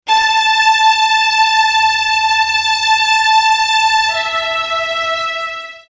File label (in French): Violon Attaque